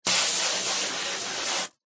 household garden hose spray water jet plastic bin lid